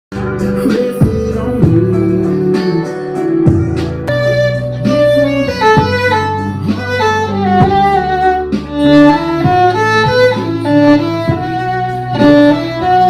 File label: Country Music Style Cover Song Violinist